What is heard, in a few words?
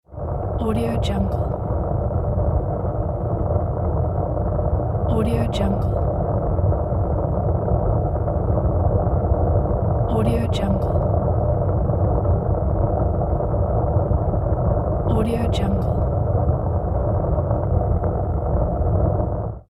flame fire; soundmount